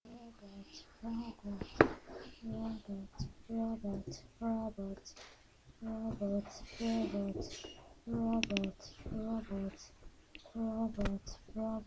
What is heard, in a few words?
robot